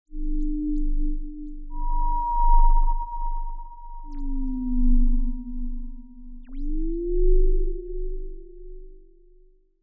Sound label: sound design effect whine whales; sound effects one